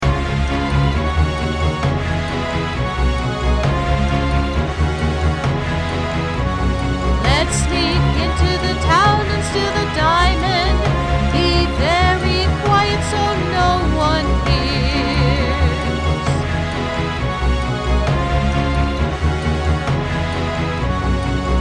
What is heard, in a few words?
vocals